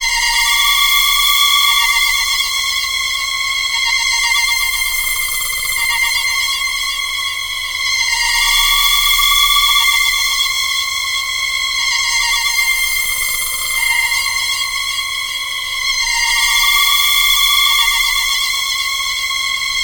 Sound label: Spooky Voices